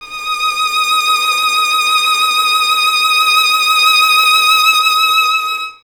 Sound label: violin; Miroslav Vitous; Solo Instruments VOL-2; Solo lnst. II